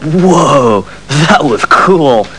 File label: Whoo